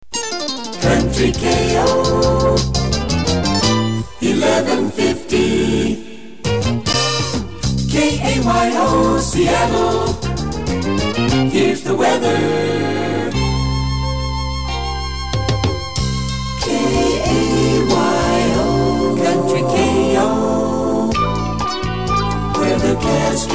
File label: KOUNTRY early 70s jingles; KAYO